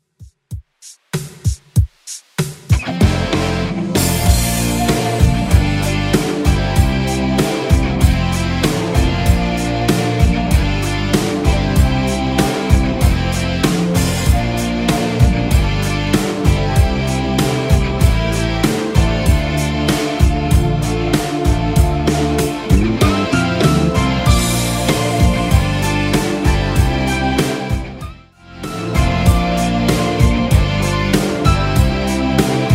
80s 96bpm; Redrum

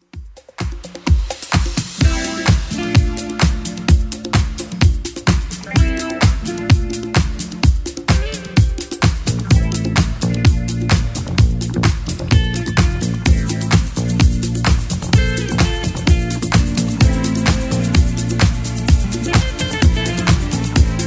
80s Club Edit 128bpm

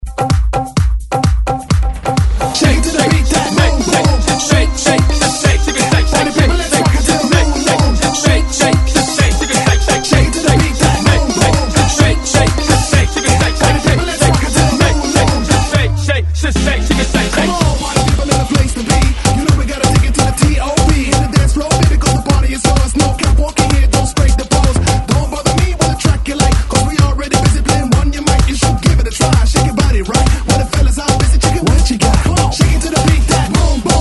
Hype ReDrum 128Bpm; DJDOLLS

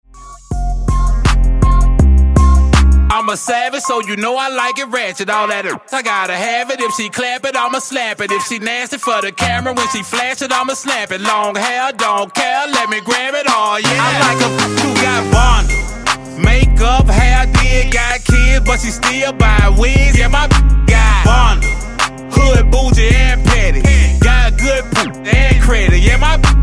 Top 40 Redrum

